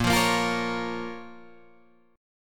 Bb Minor, Guitar, Open G

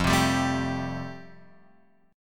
F Major Add 9th F down Guitar Open F; Fadd9 strummed; JGuitar

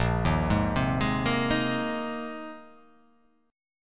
Bb 7th Suspended 2nd Suspended 4th down, chord; Bb7sus2sus4; arpeggiated; JGuitar

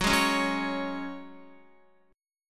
Gb Suspended 2nd down Guitar, chord; Gbsus2 strummed

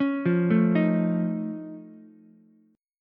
F Augmented 7th F Ukulele, chord; F+7 arpeggiated; JGuitar

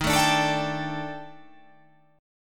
D sharp Major 7th Flat 5th, down, chord

